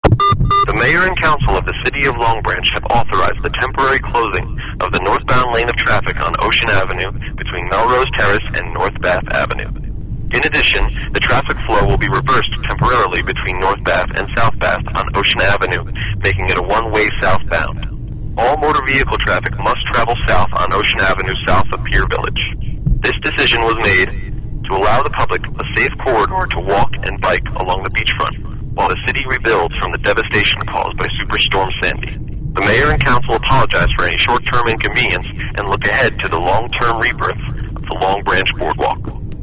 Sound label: Boardwalk Traffic